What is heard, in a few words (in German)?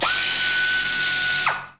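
SCHRAUB FX; Best Service; Advanced Mega Effects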